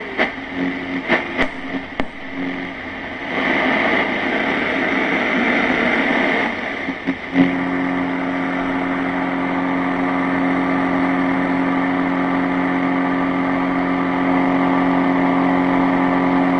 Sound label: radio